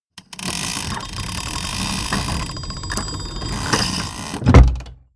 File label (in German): Brucke Movement, Die Geräusche sollen eine, kaum genutzte Holzbrücke mit metallischer Mechanik darstellen; Der Faktor „Alt“ spielt hier eine wichtige Rolle